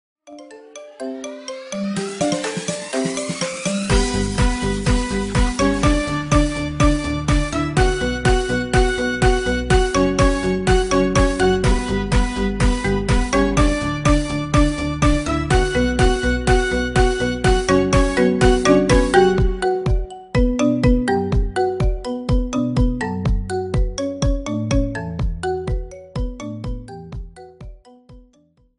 marimba ringtone remix

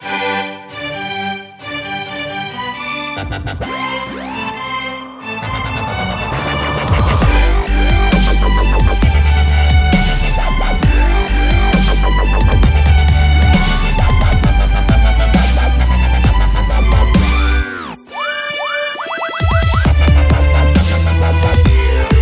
dubstep dance remix; mozart

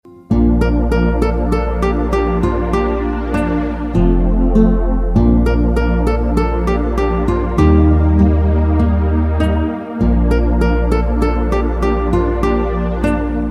ringtone

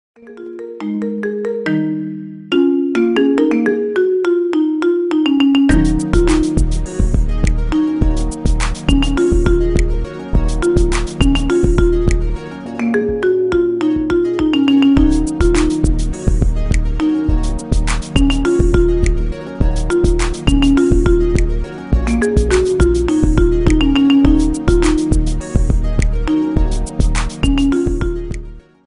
marimba ringtone remix

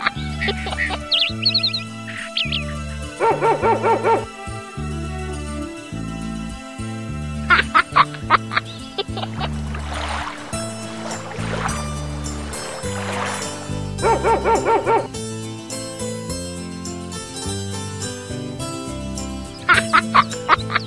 dog and child mix

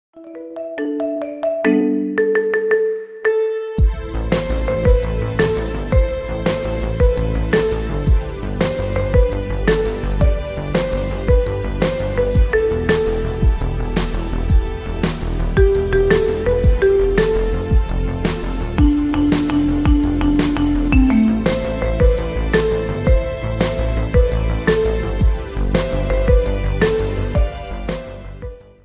marimba ringtone remix

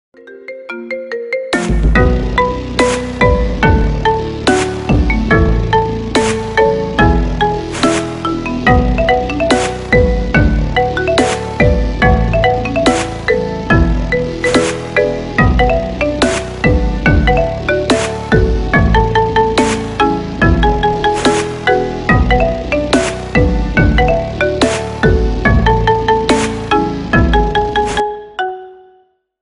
marimba ringtone remix

